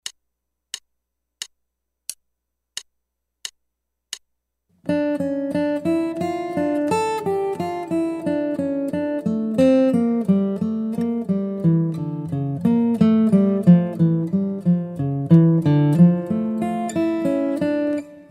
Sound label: Guitar